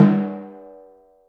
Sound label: TYMPANI G3; Greytsounds VOL-1